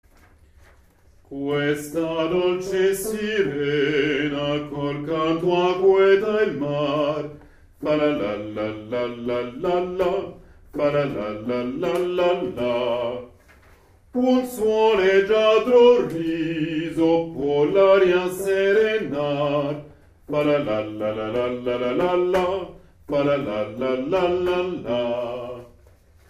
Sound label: SIRENA Basses